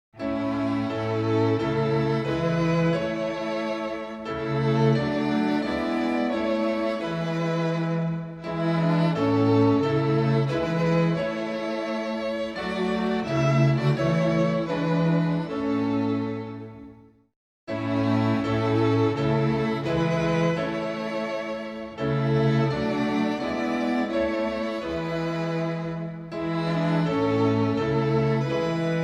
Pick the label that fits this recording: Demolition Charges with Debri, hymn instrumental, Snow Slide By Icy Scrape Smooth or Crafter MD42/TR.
hymn instrumental